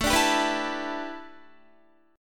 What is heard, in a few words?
A 7th Suspended 2nd Suspended 4th Guitar